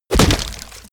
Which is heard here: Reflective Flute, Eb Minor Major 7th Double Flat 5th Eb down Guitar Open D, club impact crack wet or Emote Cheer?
club impact crack wet